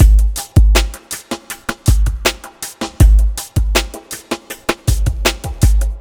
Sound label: Polarbeat, ambient